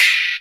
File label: Hats Electro Open